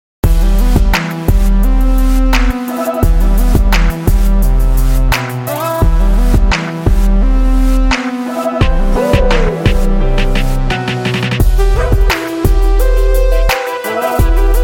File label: rookerie ruins edm